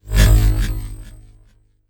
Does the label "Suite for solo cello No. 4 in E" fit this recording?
No